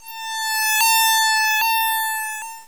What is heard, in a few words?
alarm